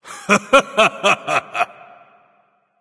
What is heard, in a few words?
laugh; Ao Kuang; Smite